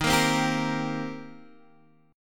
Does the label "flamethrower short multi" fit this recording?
No